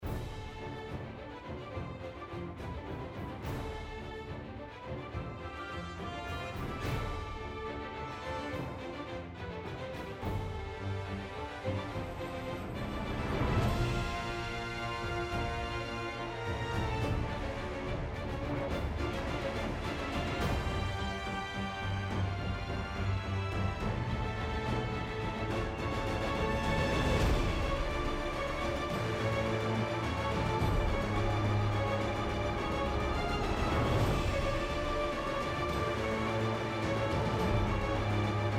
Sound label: Battle Decisive